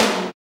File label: dry tom single hit f sharp key; tom drum samples